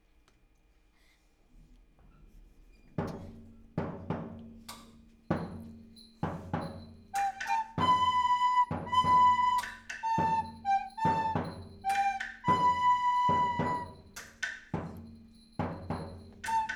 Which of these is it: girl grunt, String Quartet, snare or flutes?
flutes